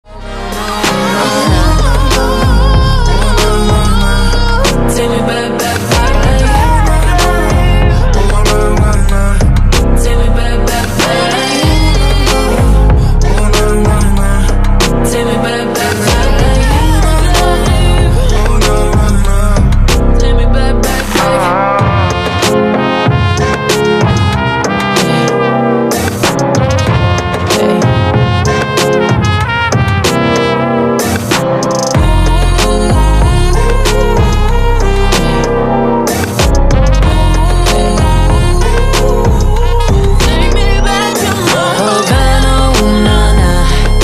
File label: slowed